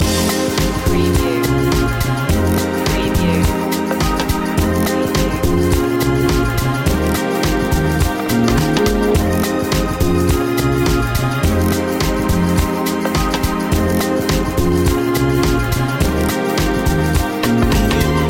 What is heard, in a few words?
flute fantasy loop